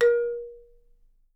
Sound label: A#3, Percussion & Harp; Miroslav Vitous - Percussion & Harp